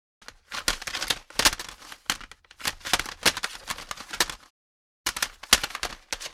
Pile of CD and DVD cases searching for a disc

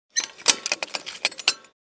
Garden tools rummaging movement

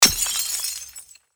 Deep glass impact

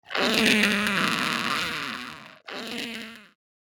Mouth sucking squeaks; misc human

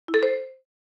Marimba alert message

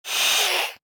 Vampire hiss monster aggressive

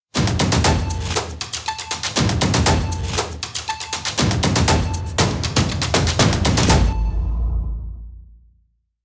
Cinematic countdown

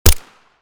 Suppressed pistol single shot; guns

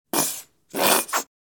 Plastic shampoo bottle squeeze

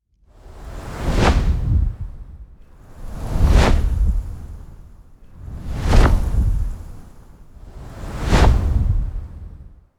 Dragon wings flapping movement multiple

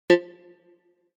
Menu button click

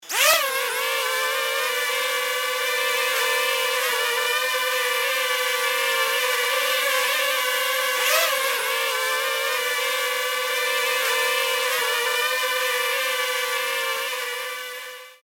Buzzing drone flying movement noise

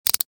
Prisoner handcuffs latch closed

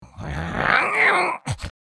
Angry grunt little fantasy creature; fantasy friends

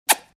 Kissing and smooching speedy

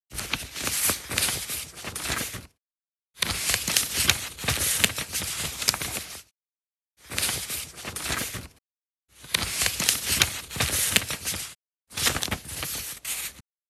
Paper shuffling and organizing office supplies

